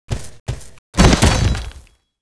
Rhinoce Lord die

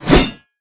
Attack; ArkArch; Gamigo

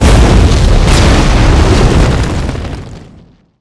seal Die; Seer; GamigoNA

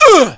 Damage

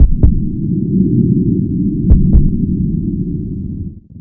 Heart; Seer; GamigoNA

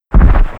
Angry Yeti Run

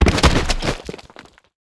Seidstar die; Seer; GamigoNA

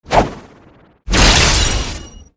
attack; FFocalor; Seer; GamigoNA

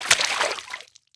frog action

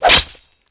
knight attack

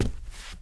statue walk

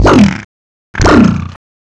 walk

